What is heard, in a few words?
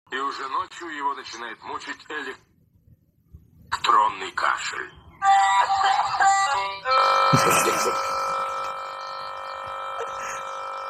electronic coughing; has bsod